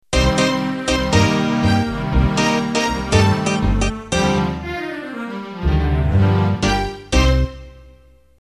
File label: Tango Ballroom End